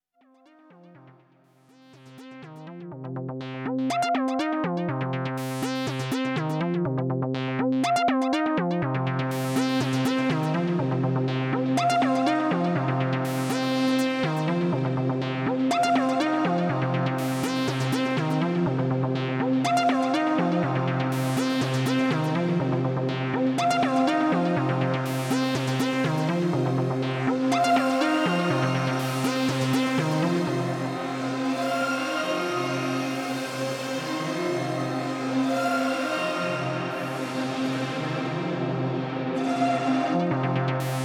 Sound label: Synthesizer Reverb Shimmer Mercury 7